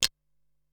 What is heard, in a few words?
Fingersnaps, Drum Machines Ensoniq SQ-R-Plus; 300 Drum Machines